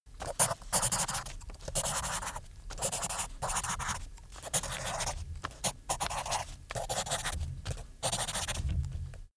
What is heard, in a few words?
pen on paper